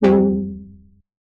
stab whurr, stabs

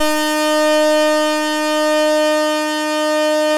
EMU MODULAR, modular syn

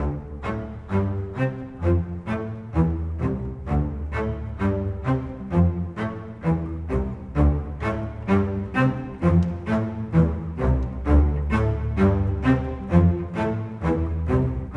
Bass Cello; Sonic Foundry (Sony Creative Software); Orchestral Series VOL-4; Rock and Pop; Rock-Pop 15

